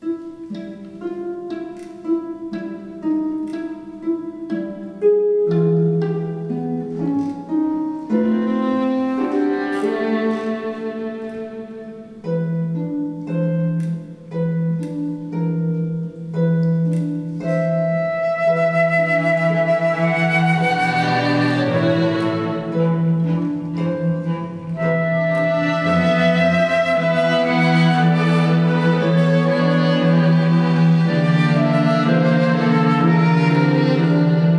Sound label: harp